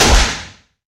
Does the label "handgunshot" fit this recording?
Yes